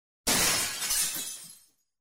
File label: glass smashes